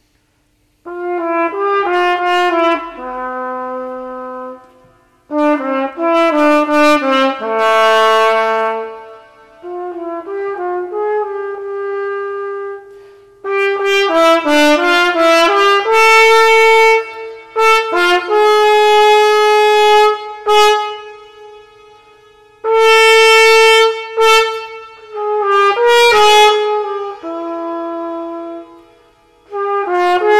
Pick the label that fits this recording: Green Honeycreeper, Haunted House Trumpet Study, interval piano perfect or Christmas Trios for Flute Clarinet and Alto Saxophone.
Haunted House Trumpet Study